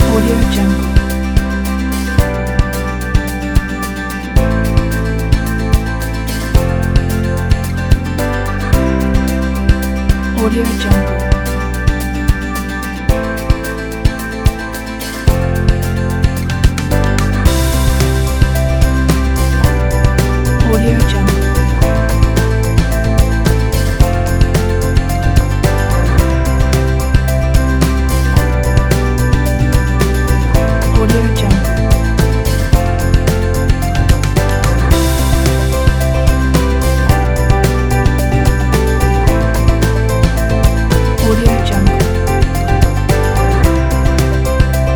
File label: for pop rock